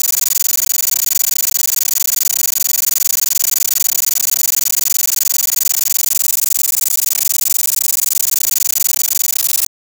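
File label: realistic heavy breathing, heartbeat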